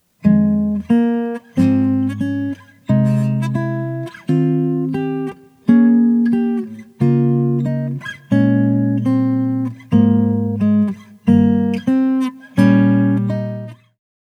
arp inversions with passing tone; guitar

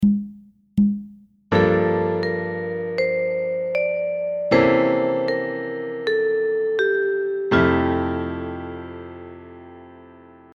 Pattern, II V I patterns in quarternotes in F

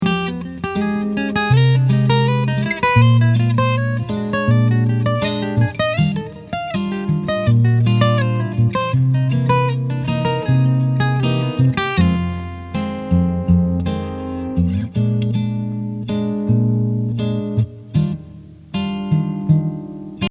Interval shapes 2 (double time)

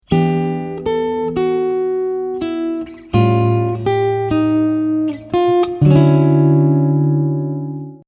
first note G

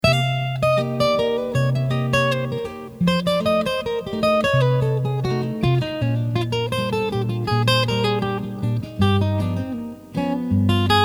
Lick with sub V reh.

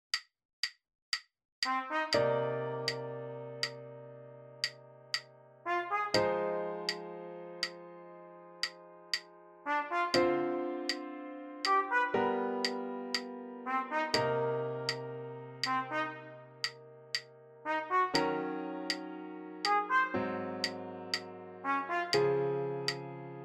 Rhythm with 1 and 3

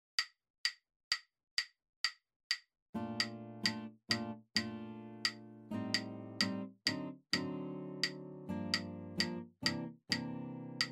bass in 3 playalong

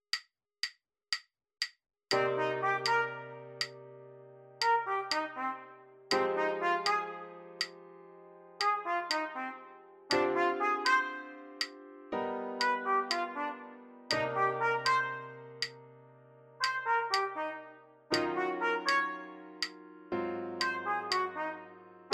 Rhythm with inversions

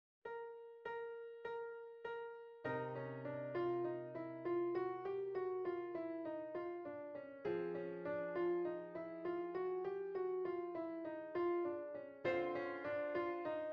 Chr. scale c-g

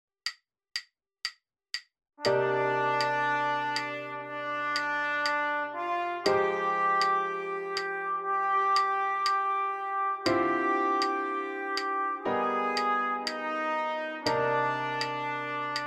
Open voicings, only tensions

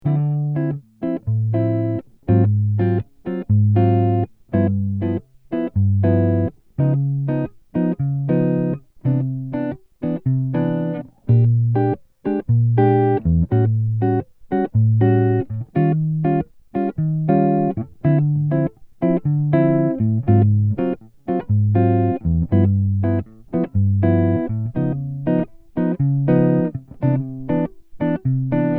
Lick Backing track sb V's in 3; Lick 10

